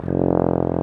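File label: brass baritone; Roland - LA Composer vol. 1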